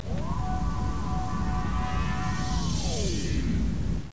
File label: replicator